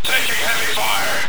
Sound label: Pain